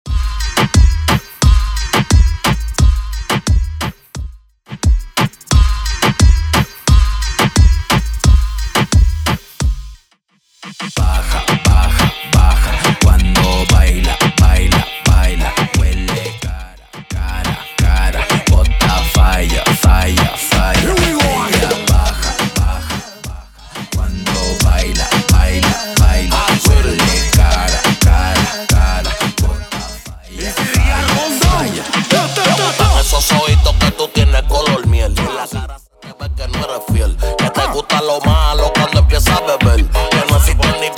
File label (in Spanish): Reggaeton Intro Outro Dirty 88 Bpm